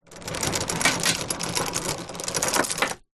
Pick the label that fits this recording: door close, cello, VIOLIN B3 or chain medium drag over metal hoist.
chain medium drag over metal hoist